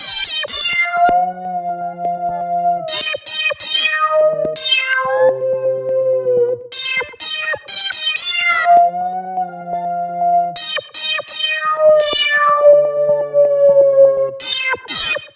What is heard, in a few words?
Crank Lead; Dance Electro